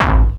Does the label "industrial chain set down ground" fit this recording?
No